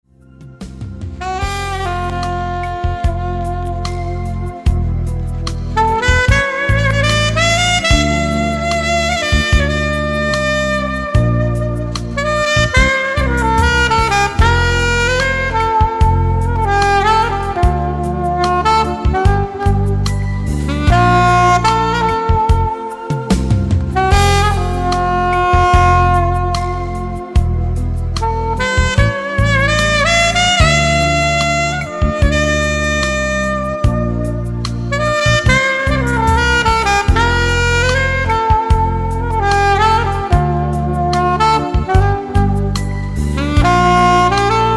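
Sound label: sax alto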